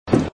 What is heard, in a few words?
KLICK; MinorityReport